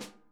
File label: snare tap; Sonic Reality; ID SNARE 3+